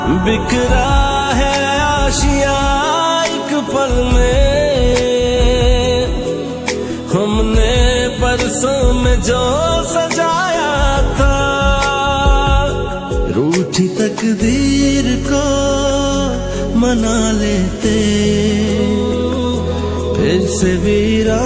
Sad Hindi

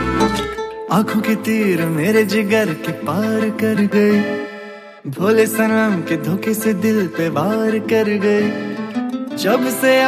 Hindi Ringtone

